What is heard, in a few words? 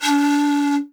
PIPE C#3, REED PIPE; Africa; Spectrasonics; Heart of Africa 1